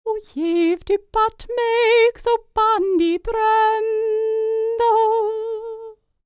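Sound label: Scandinavian, World Voices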